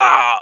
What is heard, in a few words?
MINOR PAIN; cash